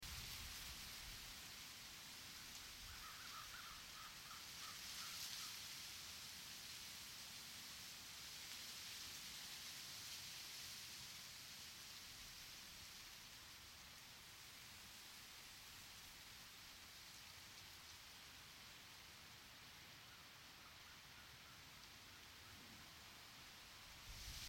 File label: ambient wind loop